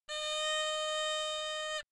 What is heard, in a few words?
haegeum